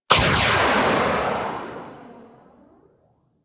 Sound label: Arch Rocket Crossbow 2D Tail, Weapons; Warframe; Archwing